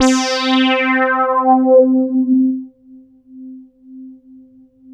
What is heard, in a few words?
P800 FD C4, Korg POLY800; Back In Time Records; Korg Universe VOL-1